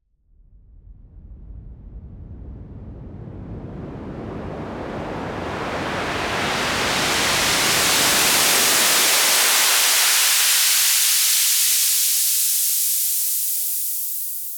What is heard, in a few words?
Raise Clean